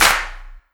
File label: short reverb clap a sharp key; single hits one shots